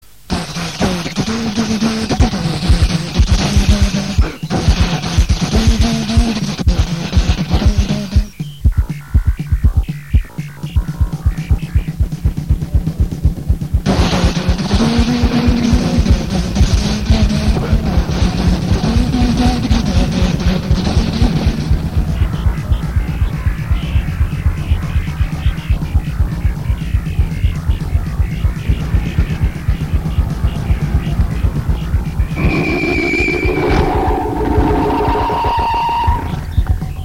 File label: instrumental; thrash avantgarde